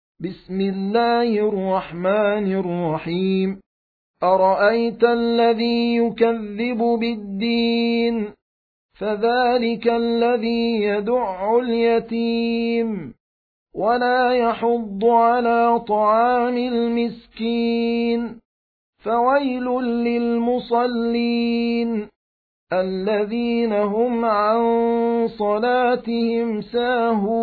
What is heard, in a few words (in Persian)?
ترتیل; سوره ماعون